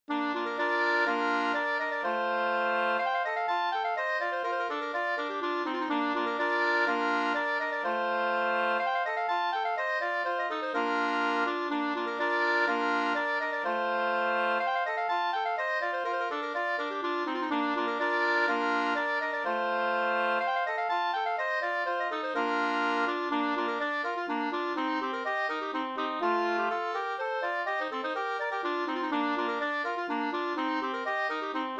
polska Lillpolska

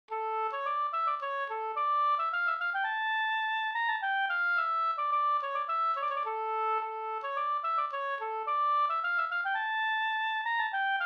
slangpolska Skratttpolska

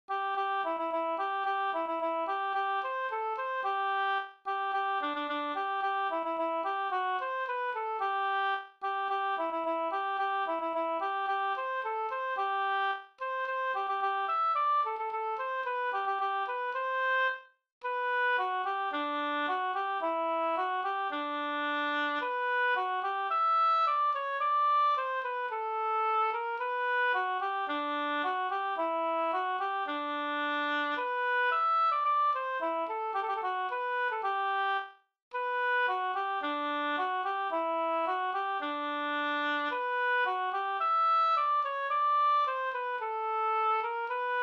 folkdans, kadrilj Cdur; Sonderborgskadrilj

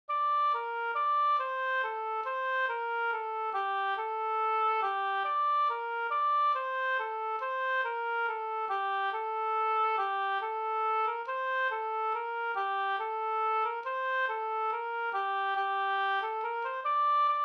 langdans; Lilla vallpiga Da Ahlstrom

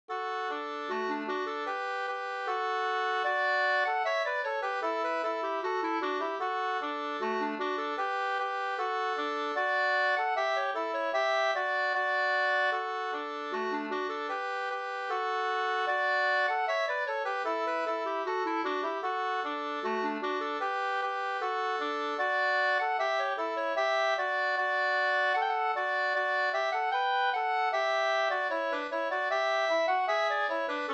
brudmarsch Ridmarsch

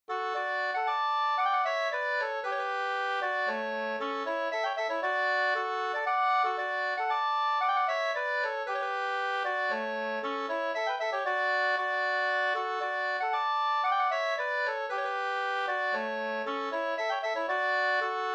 polska, 2st; e Gustaf Strutz Up

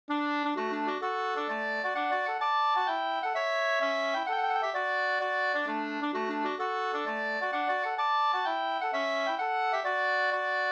hambopolska, 2st

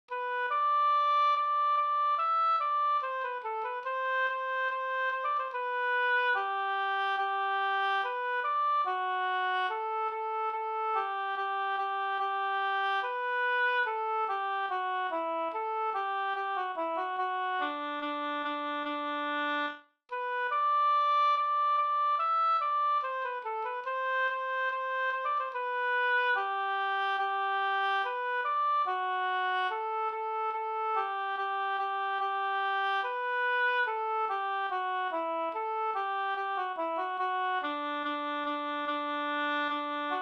brudmarsch; fr Gol Hallingdal

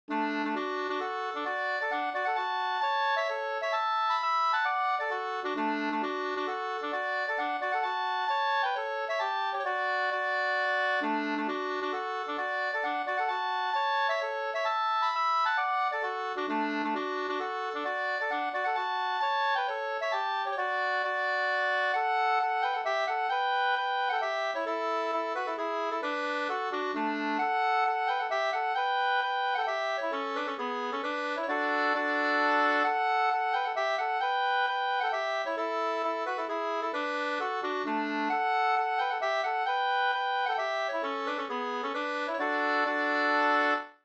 hambopolska, 2st; fr Halla

